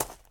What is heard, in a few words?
gravel step; Golden Crayon Sounds